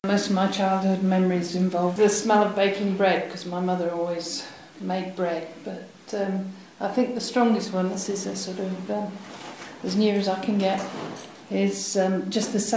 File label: wood pigeons